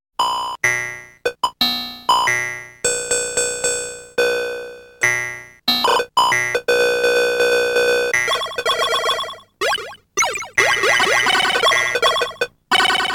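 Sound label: sound effects Medley; Namco; arcade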